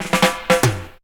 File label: Rattle n Roll, Drum Rolls; Zero-G Jungle Warfare 1, 2 & 3; 90 s Sample CDs